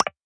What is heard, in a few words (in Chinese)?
点击音效